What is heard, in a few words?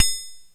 perc, opl3; M8